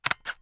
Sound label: Shutter